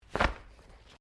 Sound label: Umbrella Shake; Umbrellas (Sound Effects:Objects)